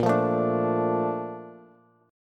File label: Bb Major 7th, Bb, down, Bass; BbM7 strummed; JGuitar